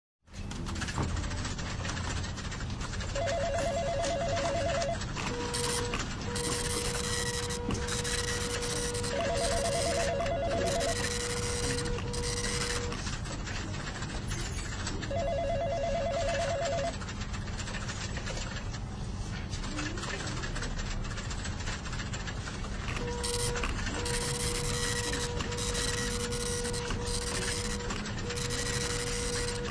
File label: Office